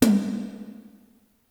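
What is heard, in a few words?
pop toms, drum kit; Giga Samples Collection; ELQ-DrumQit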